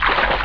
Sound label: FOOT WATR